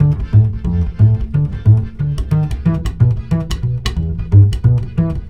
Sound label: BASS, Balkanish Traditions